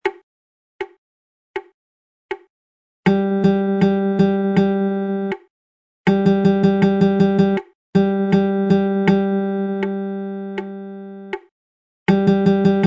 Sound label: rhythm chart, strumming